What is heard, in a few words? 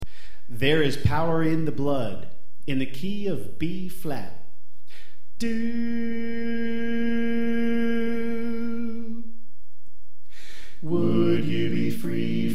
Bass Left